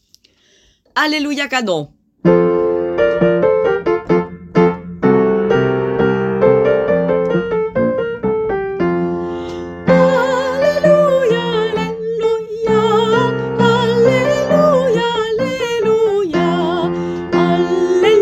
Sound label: Alleluia canon; Alleluia de Mozart